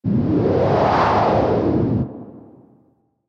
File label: magic wind